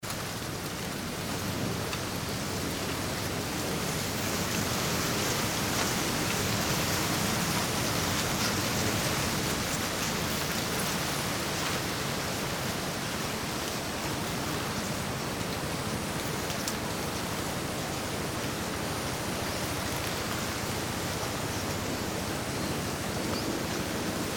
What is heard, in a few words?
sugar cane